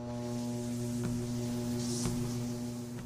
fitness laufband start; minecraft

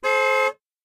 hupe; minecraft

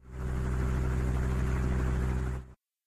helikopter indoor; minecraft

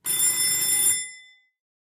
klingel schelle; minecraft

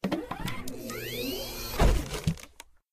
medic defi; minecraft

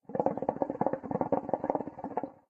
shisha blubbern; minecraft

